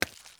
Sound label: fruit impact; physics